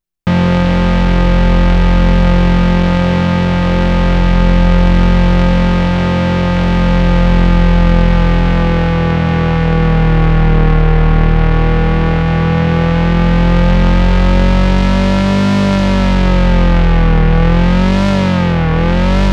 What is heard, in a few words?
LFO Pitch Mod